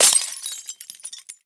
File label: glass; Minecraft; Votive World